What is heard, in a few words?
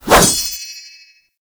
Sword Swipe; Warframe; Duviri; Dax; Weapons